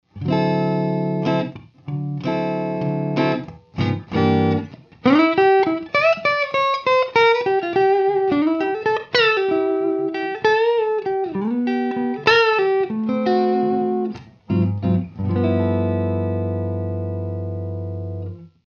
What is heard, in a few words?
marshall 100w; m75 lp